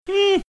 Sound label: making funny sound